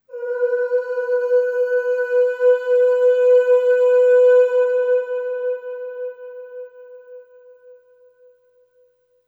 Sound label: Ooh Long B3 Long Reverb; Harmonic Building Blocks